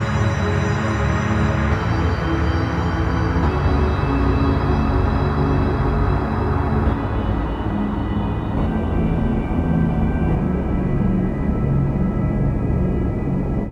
140 B Abstraction

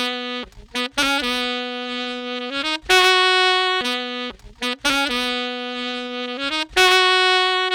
G Sax Loop 124

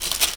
FOREST STONE foley tree shaking

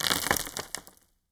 Dust, Rocks Fall

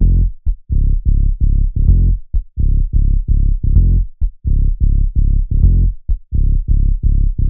BASS LOOP 128 C m

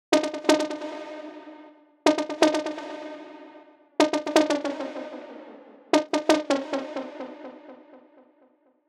Loop Lead Fx 124 C Tail

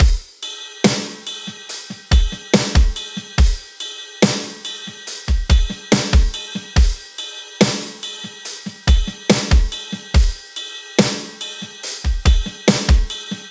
142 Fat Step Beat Bell B 8 bar

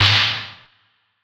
snare sleaze

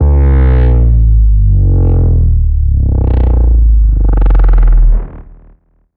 Descender, One Shot; Rapture vol1

